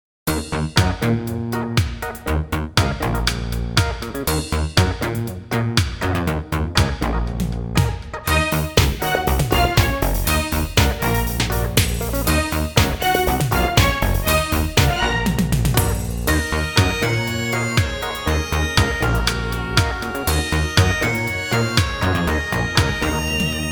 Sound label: Reggae